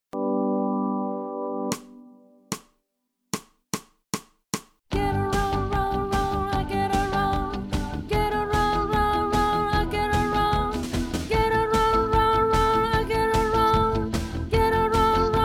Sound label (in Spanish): VOZ 1; ADIOSES SHOW CHOIR; PANDEMIA